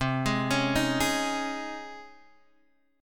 Db Major 7th Suspended 2nd C

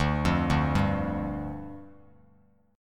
D-Minor Double Flat 5th, D, Bass Standard; Dmbb5 arpeggiated; 10,8,0,0

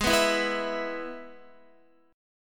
Ab Minor Sharp 5th Ab down Guitar; Abm#5 strummed; JGuitar

